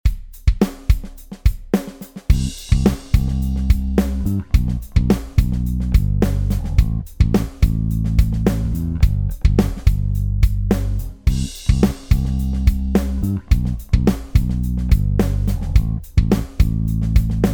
Pearl 24 Kick

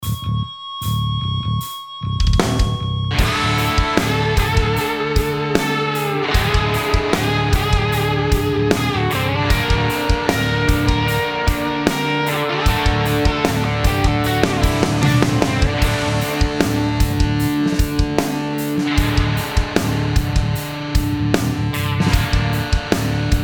Ludwig Acrolite, snare

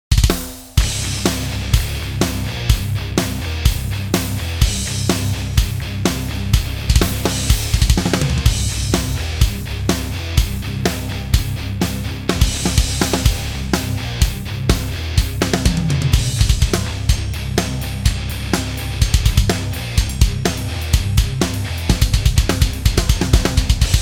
Pearl BLX 24 Birch Kick; Drum Werks

